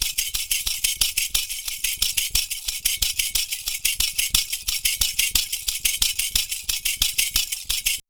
PERCU; Univers Sons; Raricussions

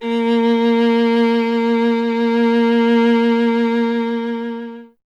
STR VLA, Viola; Miroslav Vitous; Solo Instruments VOL-1

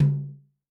Lite Mid, Tom, Yamaha DTXpress-II

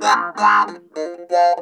harmonica B2; Funk Guitar; Zero G - Funk Guitar

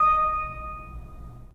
Pipe Mix Celeste, 3D Organs

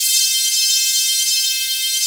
PUNCHY HP100; CMP - Analogon; HP MORPH1

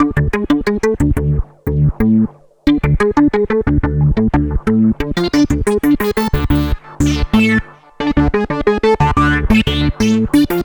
VA Synthesis, Keyboards; Sonic Foundry (Sony Creative Software); Leo Cavallo Bass Taster

